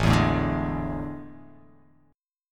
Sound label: A Major 7th Suspended 4th